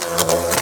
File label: Wasp